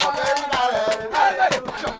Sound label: CHANT, 120